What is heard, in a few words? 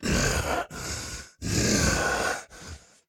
Walk Cycle Burns; The Town